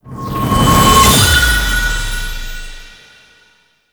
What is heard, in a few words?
Objective Success; Tau; Twelve Minute War; Objectives Meta